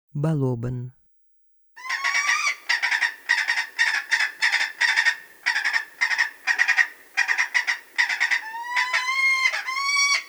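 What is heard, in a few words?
saker falcon voice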